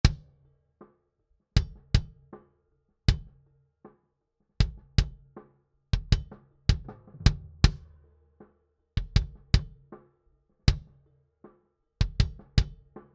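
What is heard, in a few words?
Kick Low Bleed debleed On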